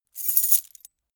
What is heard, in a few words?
metal keys handling